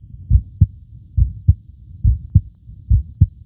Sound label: heartbeat